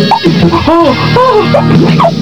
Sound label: oohhuhooo